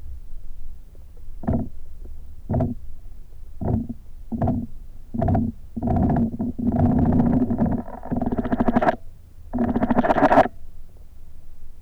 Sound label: Microcentrus, treehoppers; Cocroft